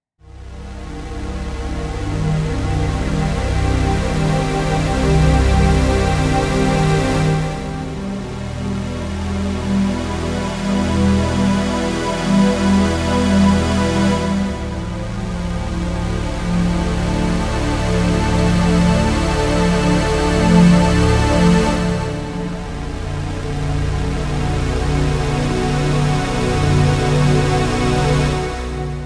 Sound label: Cinematic Triller